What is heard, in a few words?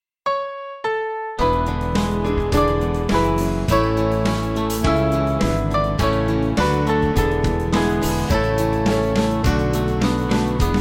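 Music Backing Files for Churches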